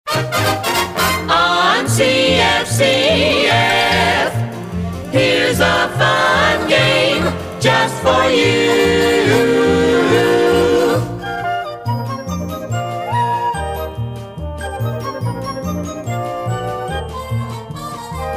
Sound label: Fun Fare; CFCF